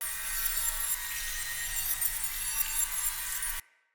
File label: Dagger Thrown Idle Loop; Weapons; Player; Swords; Fey